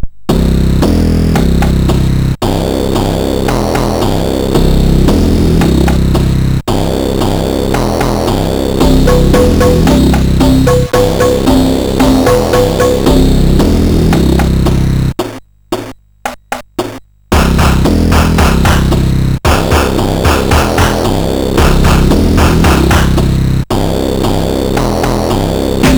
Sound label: Beat, CSG8580R5; Aztec